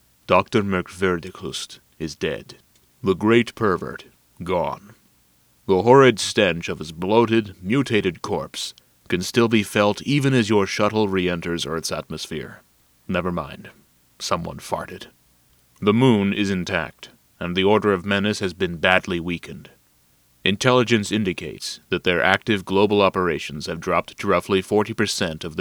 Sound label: cornet